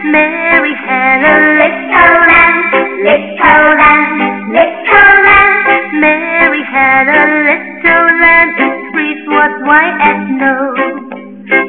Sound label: Mary had a little lamb, Human singing song